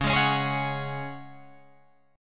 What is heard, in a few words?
Db Suspended 4th, Guitar, Open F; strummed